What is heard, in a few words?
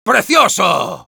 Sniper cheers, es